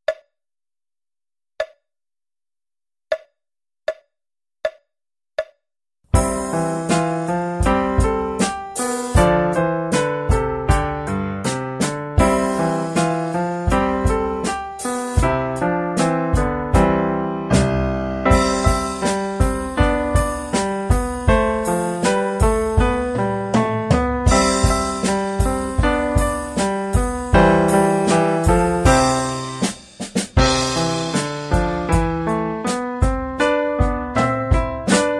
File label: drumming sessions